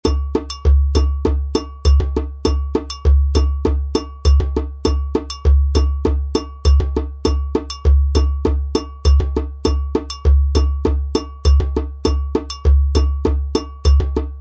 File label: rhythms